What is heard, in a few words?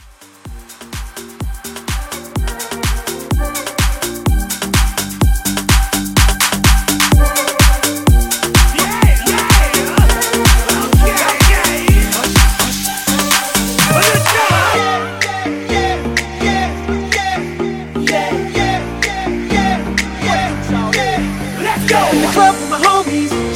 House Mashup 126